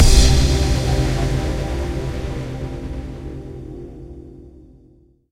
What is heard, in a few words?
stinger; Mirror's Edge (2008)